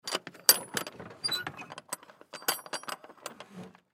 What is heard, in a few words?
Semi Truck Trailer, Semi Truck Trailer Rear Door Latch; Trucks; Industry